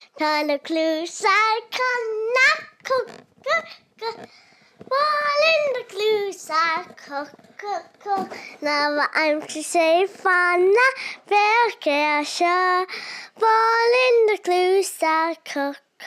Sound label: Irish Song